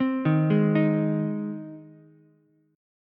G sharp Major G sharp Ukulele, chord; arpeggiated; JGuitar

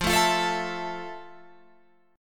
F Major 7th, Guitar, Open F; strummed

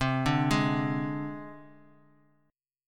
C Suspended 2nd Sharp 5th, Csus2#5 chord; arpeggiated; JGuitar

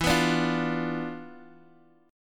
D Minor 6th E, guitar chord; Dm6/E strummed

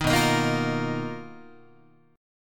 B Major 7th Flat 5th B down Guitar; BM7b5; strummed; JGuitar

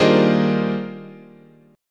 D sharp Major 13th, chord

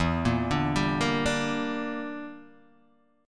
E Minor 7th Flat 5th E, Guitar Open D

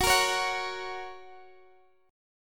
Gb Major Gb Guitar DADGAD; x,21,20,18,21,x; down; chord shape

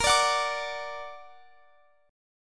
A sharp Augmented, Guitar Standard; x,x,20,19,19,22; A#+ strummed; capo=3

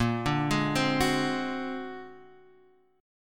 A sharp Augmented 9th A sharp Guitar Drop D

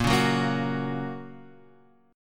A sharp Minor Sharp 5th A sharp down

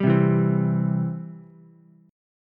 E Minor Sharp 5th E down Ukulele; Em#5 strummed; JGuitar

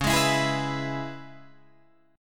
C sharp Minor 7th, Guitar Open D

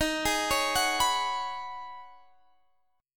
C sharp 7th Suspended 2nd Suspended 4th D sharp

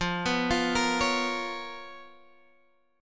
Db 13th F, guitar

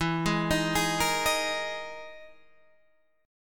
E-Major Sharp 11th E, Guitar Half Step Down; EM#11

